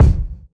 kick one shot c key, Royality free kickdrum sound tuned to the C note. Loudest frequency: 162Hz